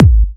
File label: Trance Kicks, Bassdrums